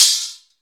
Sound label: PRC X SPRIN, Misc Metals; Club-50 - Foundations Roland; CowBel+Metl